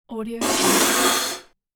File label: spitting out liquid